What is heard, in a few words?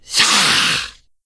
Attack; Ionar